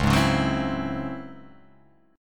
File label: C sharp Minor Major 11th; strummed; x,4,6,6,6,7,6,x